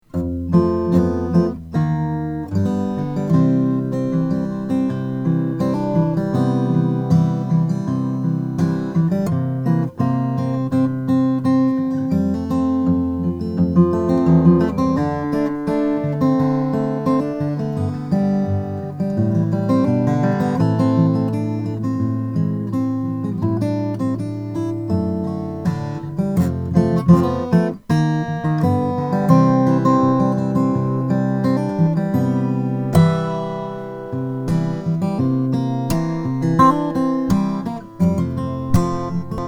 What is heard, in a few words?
simon fay model one brazilian rosewood